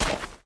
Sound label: step; smite; chronos